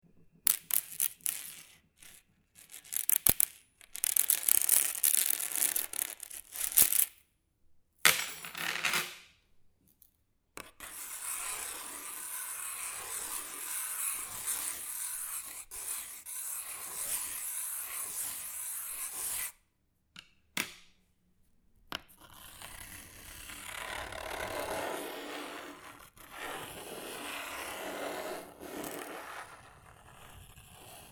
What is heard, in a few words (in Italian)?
lastra metallo